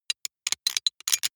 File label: sci-fi handling click; comedy-cartoon; pistolen-gewehre